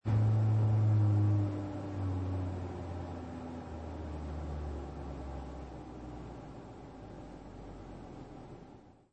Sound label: hvac